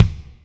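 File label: kick Wood Room, Alesis D4; 300 Drum Machines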